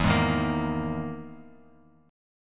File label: E-Major Sharp 11th E, Bass Standard; EM#11 strummed; 12,11,13,15; JGuitar